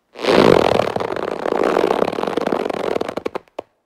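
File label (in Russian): ras, zvuk rastiagivaniia, stretching sound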